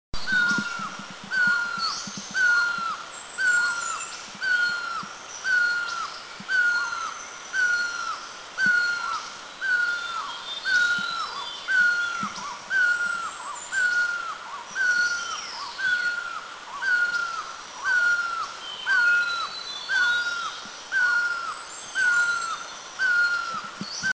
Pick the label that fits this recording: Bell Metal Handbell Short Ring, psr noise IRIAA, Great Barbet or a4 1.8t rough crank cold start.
Great Barbet